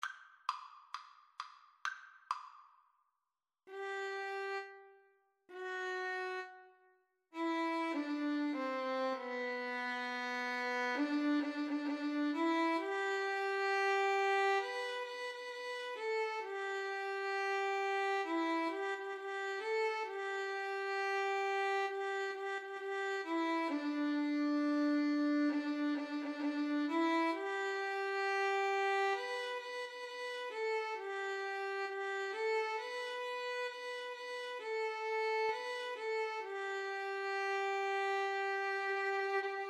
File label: this little light, Violin-Cello Duet